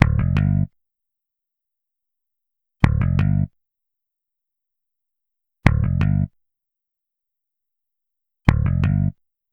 E-Coast HipHop E-Bass Gmin; Real Bass; Digidesign; Pro Tools Essential Loops

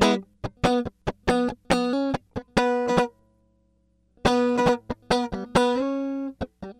070 Pop Guitar Rhythm C# Min, Electric; Pop Rock; Electric 055-099 bpm; Pro Tools Essential Loops; Digidesign

